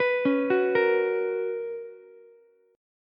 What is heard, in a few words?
B Major 7th Suspended 2nd Ukulele, chord; BM7sus2; arpeggiated; JGuitar